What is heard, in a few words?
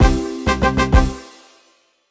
Jingle Finish; clubhouse games 51 worldwide classics 2020 switch gamerip; Toy Tank